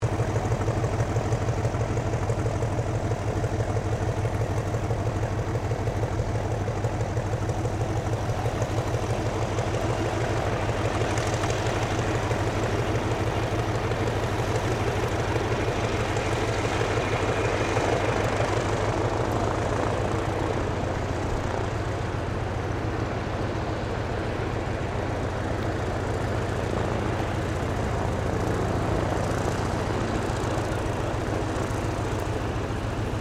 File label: AIRCRAFT AVRO 643 MKIA CADET IDLE, Biplane; 1934; Airplanes; Vehicles